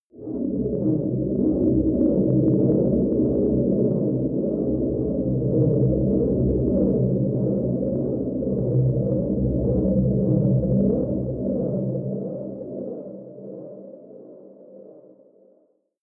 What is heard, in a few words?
underwater drone